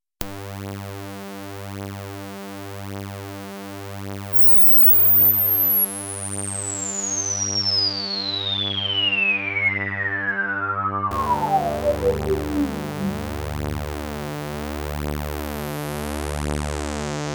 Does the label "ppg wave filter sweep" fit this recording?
Yes